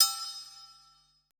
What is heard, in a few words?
HI TUBE, PERC; BPC PERC